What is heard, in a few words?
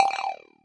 Tin Marble, cyborg kit; UNUSUAL KITS and FX